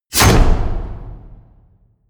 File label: wham, whooshes